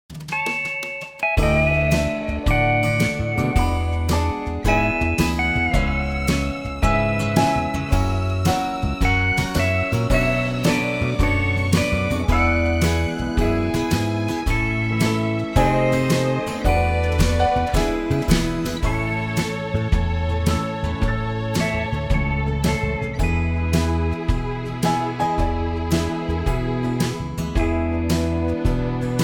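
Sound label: A, backing track; Country; key - A - vocal range - G# to B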